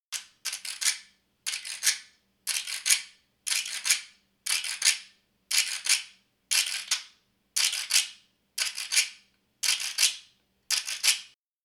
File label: Quejada Cuban, quijada jawbone